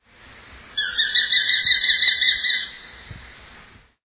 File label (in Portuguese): caure